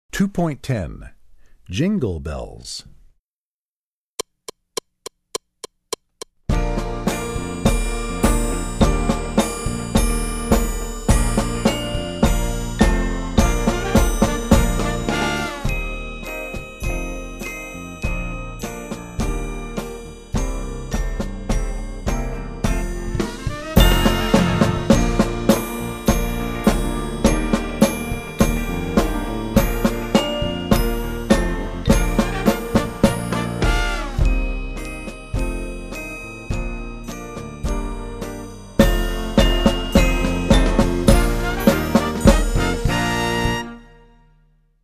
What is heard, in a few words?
Jingle Bells, Percussion; Percussion Book 1